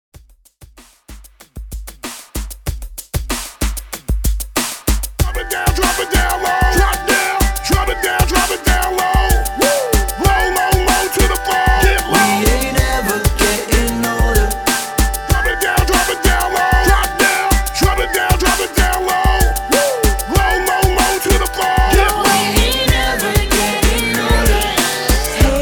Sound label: BEATBOX HYPE REDRUM 95 BPM